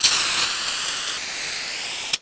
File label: Spray; Cars; XBOX; Ambient